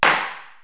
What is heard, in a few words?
gavel; KABOOM! - Wild and Wacky Sound Software; citylife; law